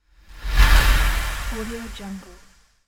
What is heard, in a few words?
air swish